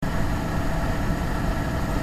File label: fan